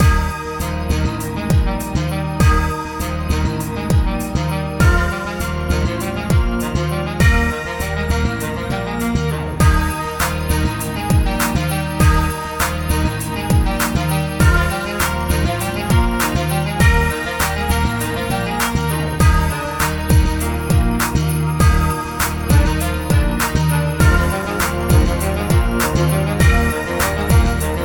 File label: Spooky Mix (Instrumental); Cocoa; Friday Night Funkin Spooky Mix Volume 1 windows gamerip 2024